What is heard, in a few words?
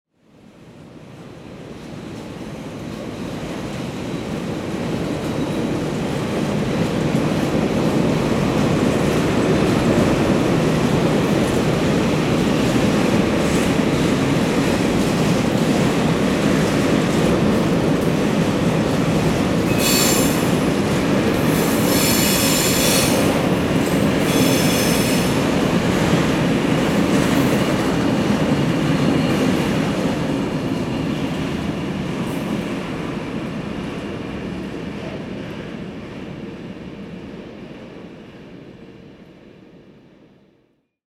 train passing